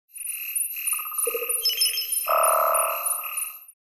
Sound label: Swamp Animals